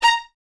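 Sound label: Violins A#5 staccato left; Miroslav Vitous; String Ensembles; 11 Violins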